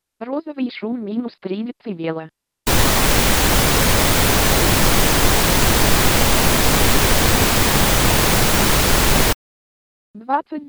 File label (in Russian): tretoktavnyi stereo s metkami; Magnitola Avtozvuk